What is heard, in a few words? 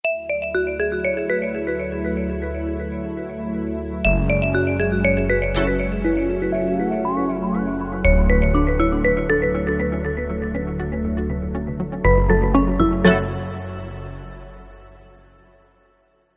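nokia cool, ringtone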